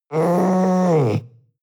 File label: panther young moan